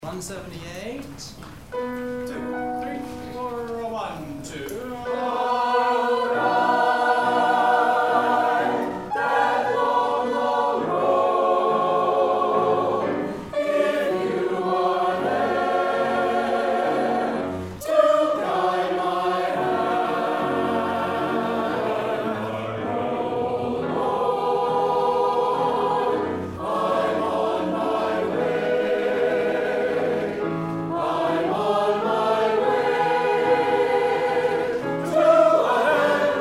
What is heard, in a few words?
SATB Im on my way